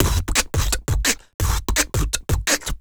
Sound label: Human Beat Box, Drum & Bass; Loopmasters